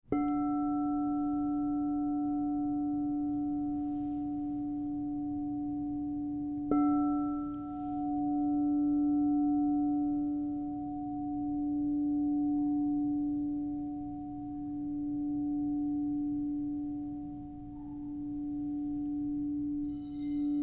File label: Crystal Tones Singing Bowls